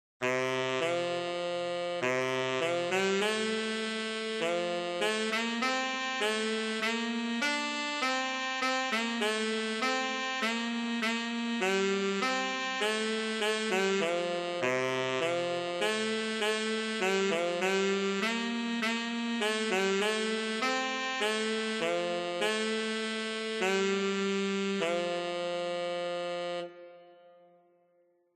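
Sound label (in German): Sankt Martin, Tenor Saxophone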